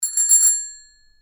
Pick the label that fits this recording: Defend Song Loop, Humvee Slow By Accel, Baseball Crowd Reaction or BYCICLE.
BYCICLE